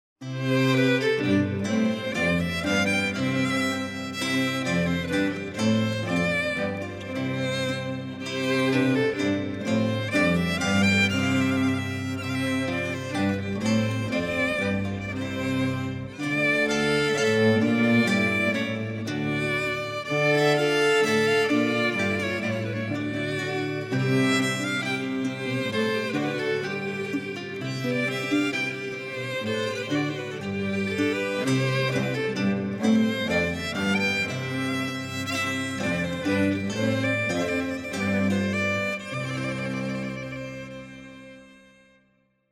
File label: orch